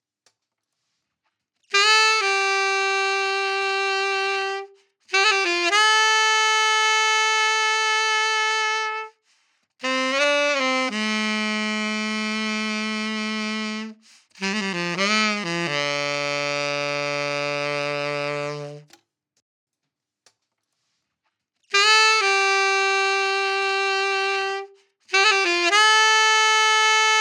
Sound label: dry